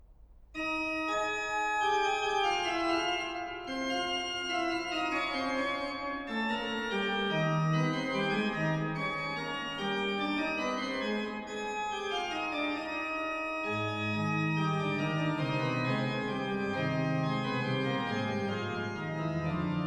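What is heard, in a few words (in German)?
Fuge, non troppo allegro, Orgel; Sonata Nr. 4 G-Dur op. 88 - III Fuge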